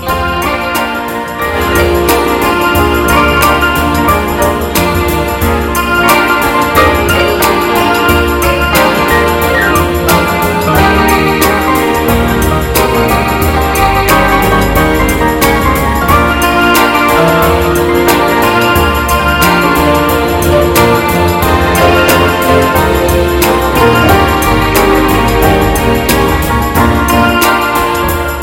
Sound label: Slow but Hot